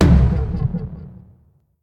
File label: Taiko Mid; smite; raijin